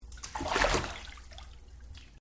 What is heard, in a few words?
Water Bath Spa Movement